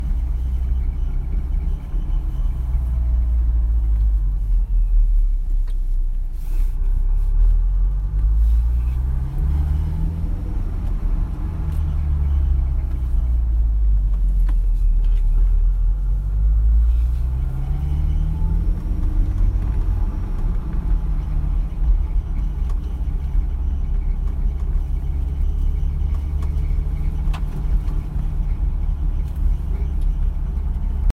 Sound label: chirping screeching noise front s2000 noise; s2000 under hood